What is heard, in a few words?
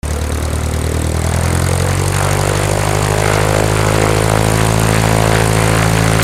flight